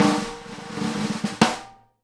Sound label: snare ROLL HIT, Live Snares; E-MU Producer Series Vol. 8 – Platinum Phatt (CD 1)